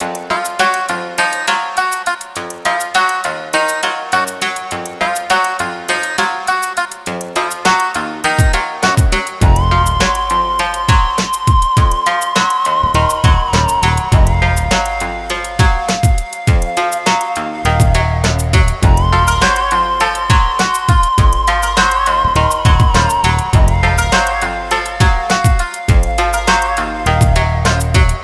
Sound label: 102 Fmin, Hip Hop Exotica; Big Fish Audio